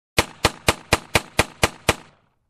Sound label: Fast multiple distant pistol shots